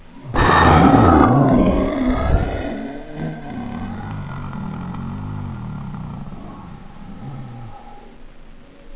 bc die; monsters; spitter

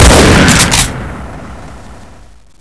shotgun fire; weapons; AoMClassic

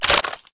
shotgun open; weapons; kuilu

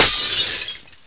glass, debris

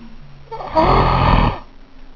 bc pain; monsters; spitter

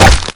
swing hit; minigolf

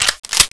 deagle clip in; weapons

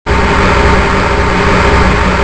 engine; ambient; revil

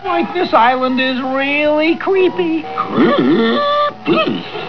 creepy; duckhunt; scoob

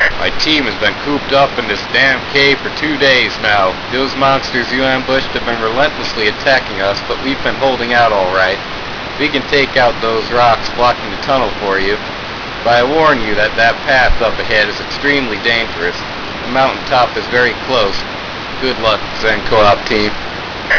grunt, leprechaun; svencoop

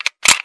mp5k mag in; weapons

